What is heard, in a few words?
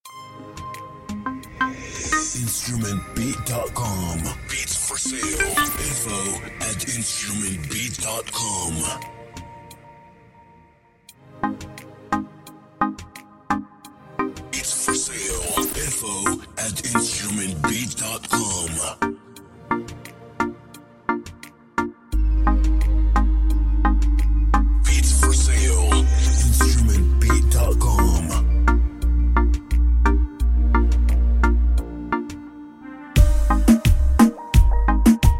Beat Reggaeton